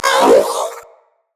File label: Companion Vocal Pain, Pets; Zanuka